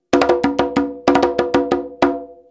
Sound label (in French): frise, congas